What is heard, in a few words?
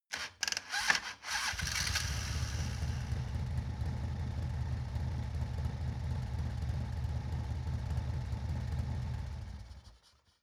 motorcycle harley engine ignition on off